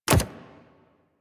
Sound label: Step Default, Foley Footsteps, Pets; Warframe; Zanuka